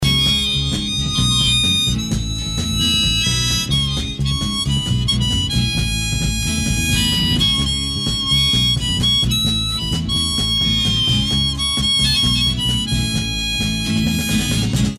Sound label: solo